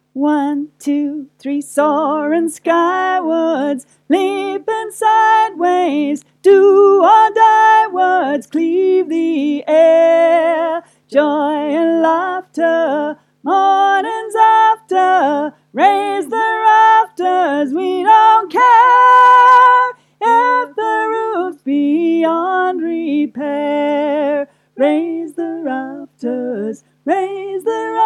melody, Folk Choir

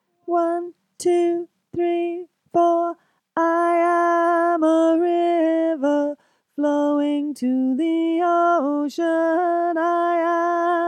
alto

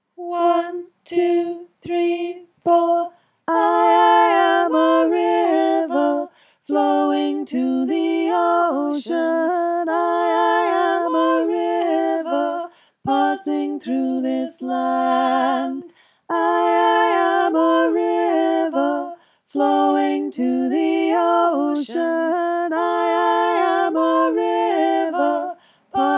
sop alto

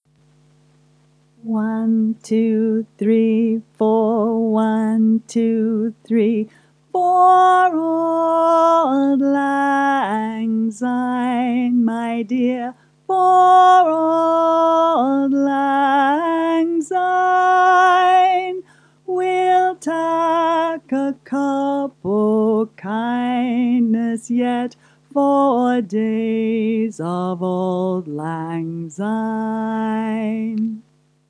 Auld lead chorus; Auld Lang Syne Resources

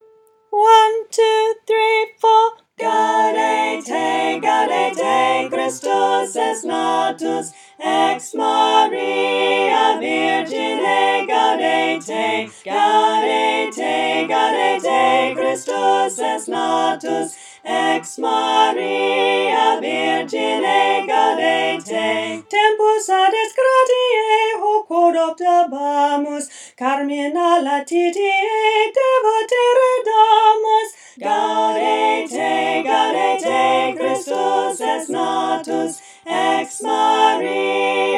full chorus; Gaudete

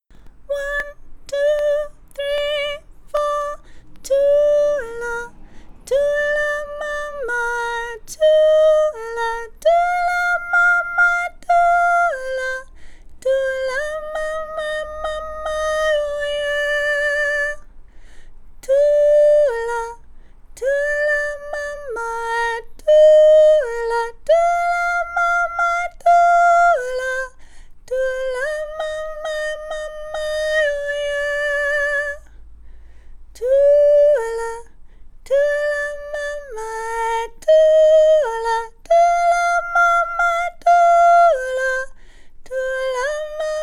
high soprano harmony

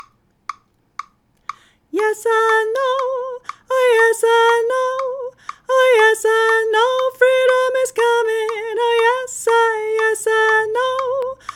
soprano with click

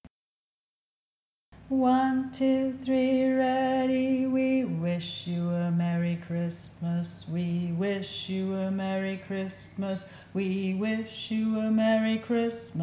We Wish You A Merry Christmas LOW SOLO; Folk Choir music

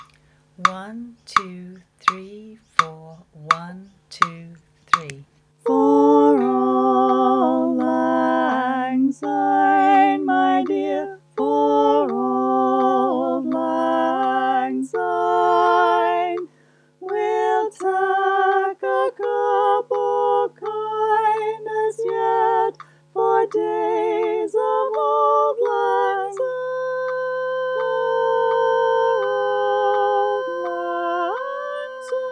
Auld Lead-bass coda in C, Folk Choir; Auld Lang Syne Resources

